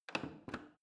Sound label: plastic button creak press